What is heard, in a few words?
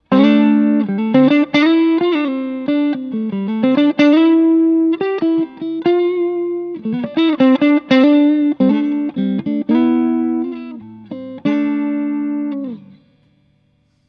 Clean